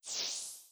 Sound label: Soul Pickup; Hollow Knight